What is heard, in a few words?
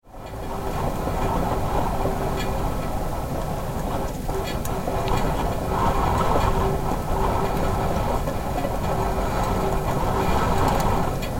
Wind in Wires